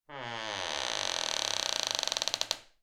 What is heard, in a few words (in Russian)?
skrip derevyannoy dveri